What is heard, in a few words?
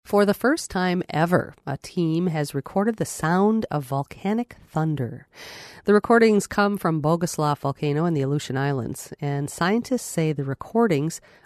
thunder, volcanic thunder; scientists record volcanic thunder for the first time